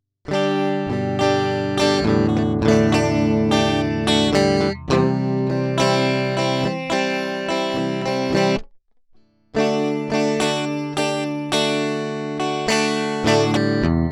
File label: Guitar Direct; Focusrite